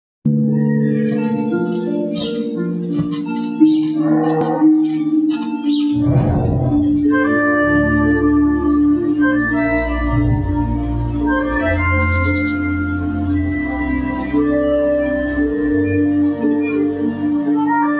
karthikeya 2 bgm ringtone love bgm ringtone movie bgm ringtone